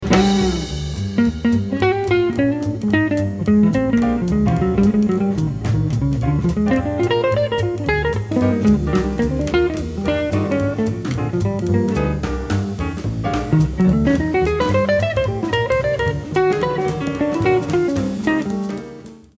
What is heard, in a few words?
solo